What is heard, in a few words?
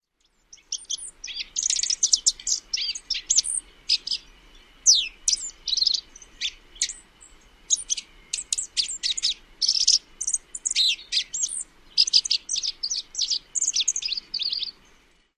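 Dark-eyed Junco spring warbling song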